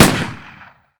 walther shot, weapons

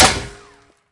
metall, bullet collide

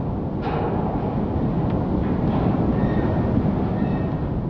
hangar wind, ambient

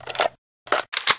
reload, weapons

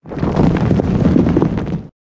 rock, ambient outdoor

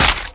bounce, large weapon collide

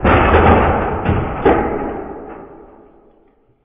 hit; ambient

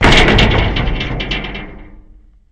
metal; ambient

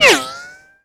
whine, weapons

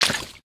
step water, human

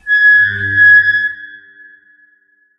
metal, underground

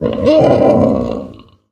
boar panic

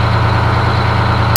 apc run, car

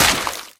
impact weapon large water; collide; large-weapon

